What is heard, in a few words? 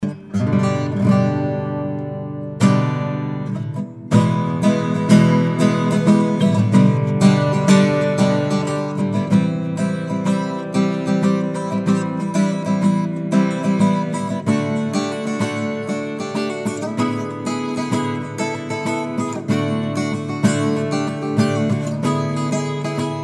Acoustic Jam